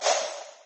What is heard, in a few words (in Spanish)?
estrella sale; paper dash